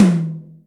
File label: Amb Tom M, Acoustic Tom; Yamaha DTXpress